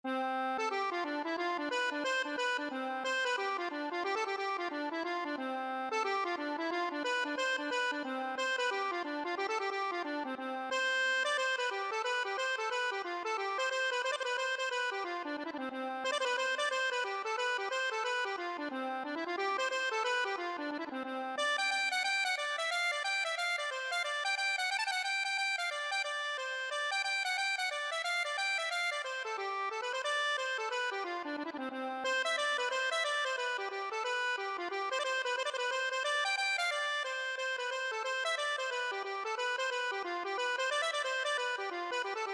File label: jennys welcome, accordion